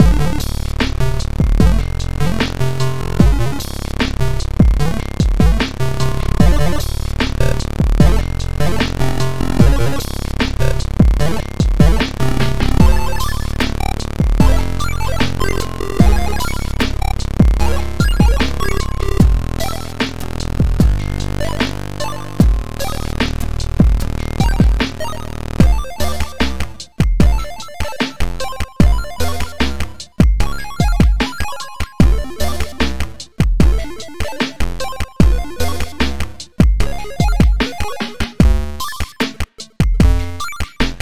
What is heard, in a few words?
PSG Digidrums Twin Buzz 50Hz 75bpm; Bonus FlashCode